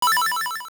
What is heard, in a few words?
Menu Select; Making a selection on a menu